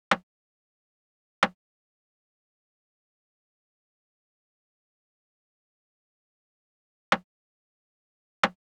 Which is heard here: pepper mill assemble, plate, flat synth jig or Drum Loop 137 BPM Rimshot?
Drum Loop 137 BPM Rimshot